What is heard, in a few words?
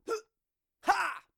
"Huh haaa" Dry